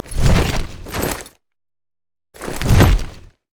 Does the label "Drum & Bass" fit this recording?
No